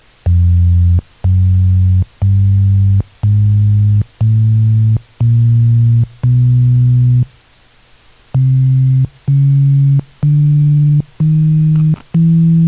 tones, hammond tonewheel; capacitors

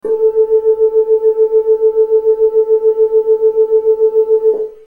old vibrato, hammond vibrato; Hammond M-100 Series Vibrato System

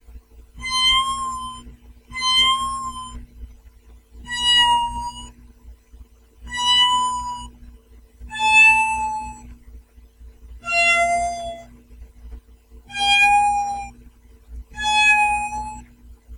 meow, paia stringz n thingz; PAiA 1550 Stringz’n’Thingz Synthesizer; Kittens: Playing around with long attack (300ms) and decay (800ms) times, envelope and LFO controlled folding, a touch of tremolo

